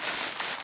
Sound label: wounded drag; Titanfall 2